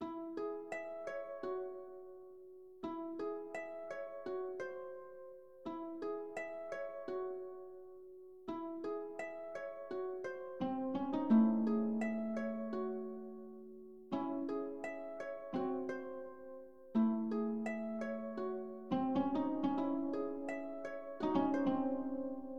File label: music; brutalmaze; soundfx